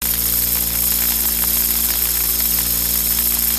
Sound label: security bot beam electric; gow; fortifications